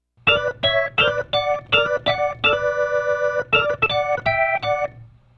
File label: Soul Organ Riff, 70s Analog Funk; Sonic Foundry (Sony Creative Software); What it Is!